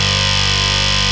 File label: RAVE BEEP; Best Service Alpha Dance vol.2